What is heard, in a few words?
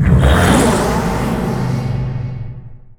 shadow poison; weapons; dota; shadow demon